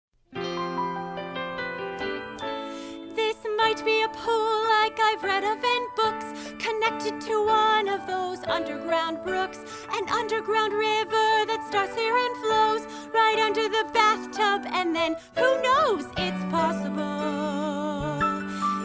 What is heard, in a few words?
its possible vocals